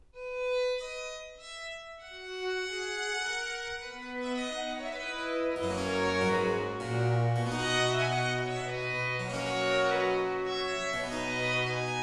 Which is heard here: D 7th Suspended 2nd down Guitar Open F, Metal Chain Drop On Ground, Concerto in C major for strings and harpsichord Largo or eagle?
Concerto in C major for strings and harpsichord Largo